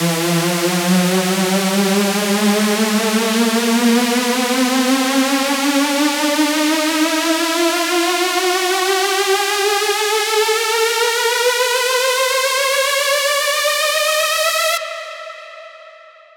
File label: Saw E